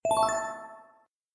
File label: Pick Item; Kalimat Karash; Android, iOS